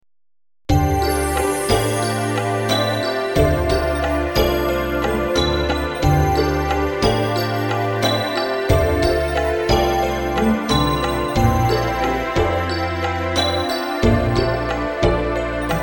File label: snowy road; 雪道; WolfRPGEditor